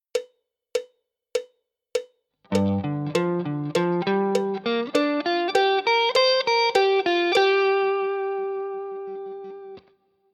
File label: G minor pent connecting lick, guitar